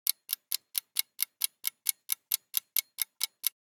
Clock Tick - Close Up Stereo Panning; Hollywood Edge; sound designer's toolkit v2

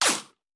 Bullet Ricochet; Sound Ideas; Guns

